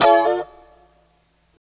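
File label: E 4 A mono slide L, guitars; Spectrasonics; Hans Zimmer Guitars VOL-1; 90's Sample CDs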